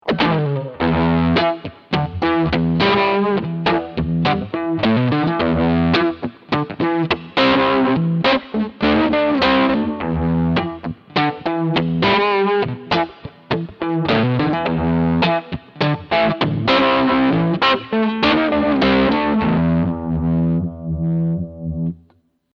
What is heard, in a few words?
Chorus Vintage